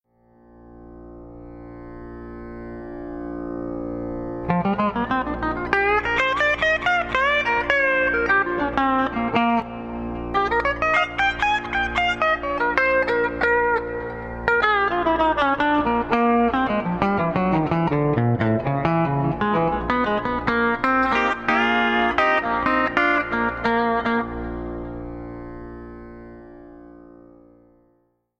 Blues Run drone, pedal steel

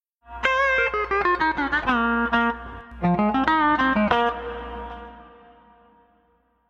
Blues Run, pedal steel

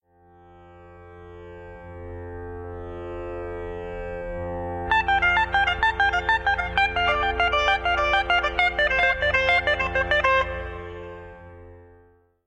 Horse drone, pedal steel

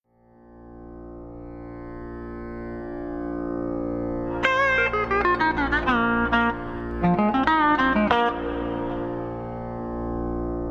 Blues Run drone, pedal steel

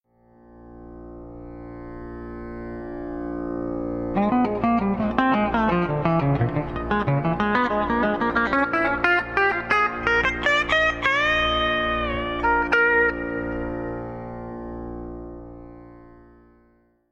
Dim Run drone, pedal steel

